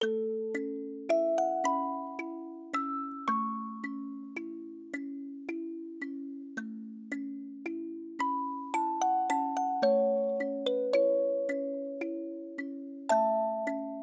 Khoomei (Music Box)

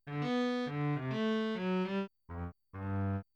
Cello

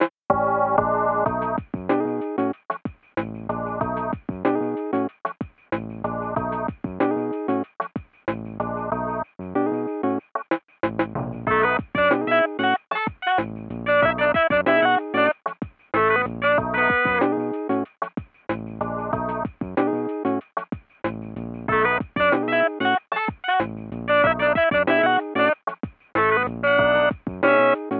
Groove (Aircheck, Phono)

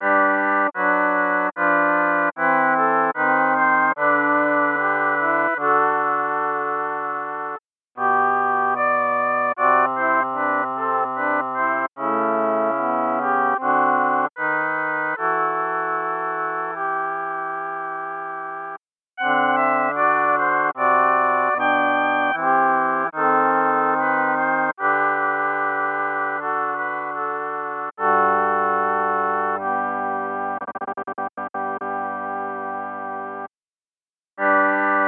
Aircheck, Phono

